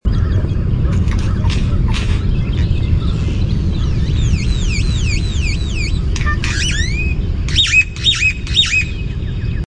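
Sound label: Great-tailed Grackle song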